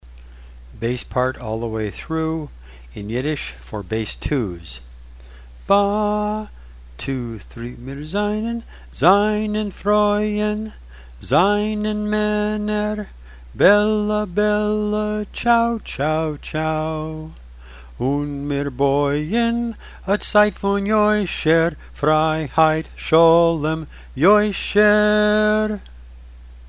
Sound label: Bass - Yiddish; Bass 2; all the way through; in Yiddish; Bella Ciao